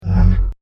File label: Light Saber, science fiction sound effect; star wars / trek style games; Andrew Fleming